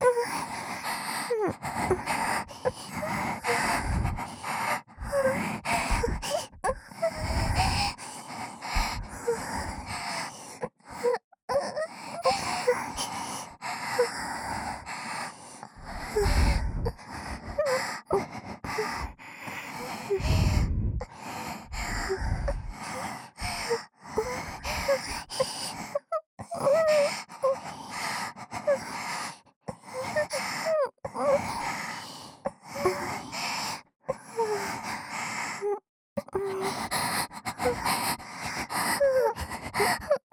耳元喘ぎ; 美月＆美星のW耳元喘ぎ

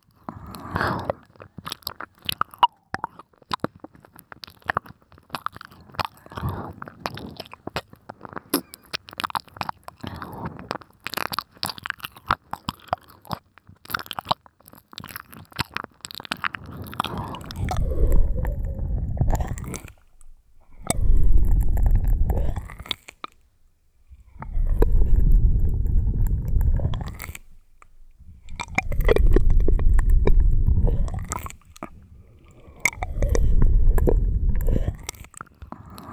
吐息舐めMIX

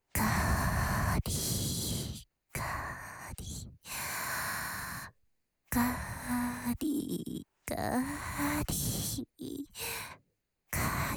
乳首責め（かりかり・右）（SEあり）乳头刺激

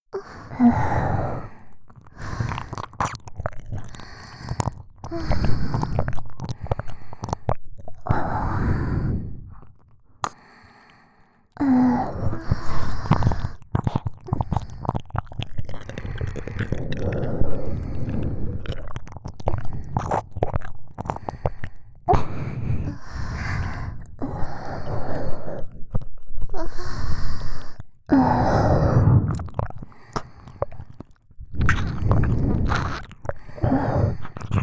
極深耳舐め+甘い吐息ループ

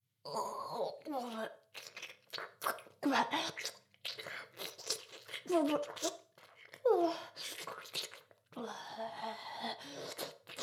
ダウナー風俗 フェラ

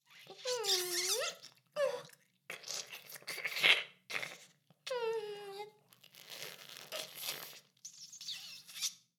フェラ音声; ダメなメイド

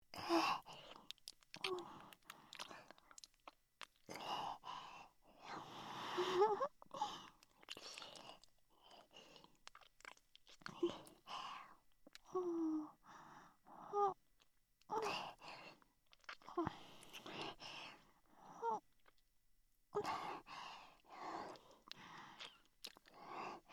ディープキス音; ASMR

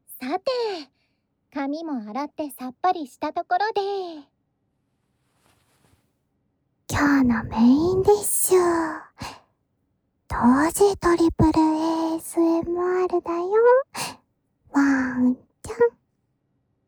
炭酸綿棒＋タッピング＋クリームASMR

